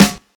dry acoustic snare sample g key, acoustic snare sound tuned to the G note